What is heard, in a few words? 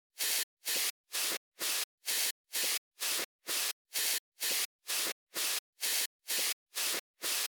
Techno beat with field recordings rainfall into hi hat